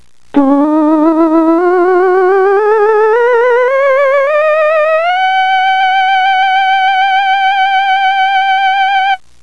Theremin, pitch modulation only (controlling pitch bend of synthesiser).